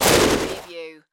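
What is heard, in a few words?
Production Element Hit Distort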